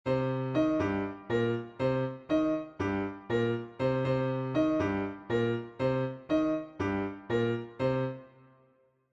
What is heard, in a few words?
montuno, latin jazz salsa